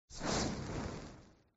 buff fire; Thunder Fighter

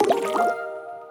win multiline; Hungry Shark; Wazdan

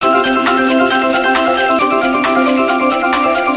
countup; Lovely Lady; slot game

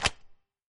click crunch; Hungry Shark; Wazdan

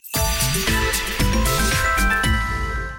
win; Grand Casanova

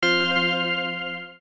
autoplay off; Book Of Lords

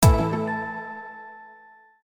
win; Fruit Express

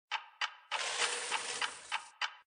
WAIT USER CHOICE; Mega Joker

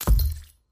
gambler mouseclick; Great Book Of Magic Deluxe; Wazdan

